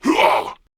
Pain, Male

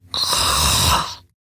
Snores, Grandmother, Vocalizations

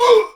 Pain; Warframe; Tau; Dax; Ashigaru

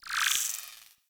Rail Jack Tech One Off

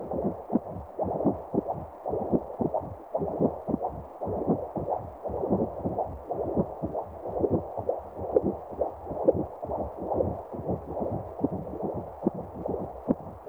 Infested Vein Beat; Ambience; Props; Warframe; Infested Eris

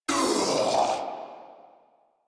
Death Corrupted Grineer Male

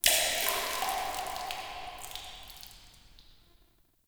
Interior Cave Drips; Ambience; Warframe; Duviri

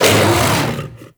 Kubrow Armored Vocal Attack Medium; Warframe

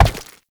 Step Goo; Movement; Uriel; Warframe

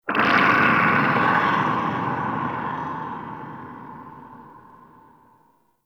Exterior Deep Space Booms, Ambience; Warframe; Railjack

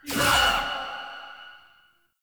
Choir Erupt Proj Throw

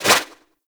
Turbulence Deflect; Tengu; Warframe

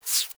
Foley Idle Lick Furr; Enemies; Warframe; Catbrow

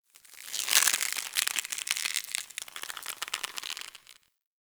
Tendon Stretch Medium Close, Infested; Ambience; Warframe; Infested Eris

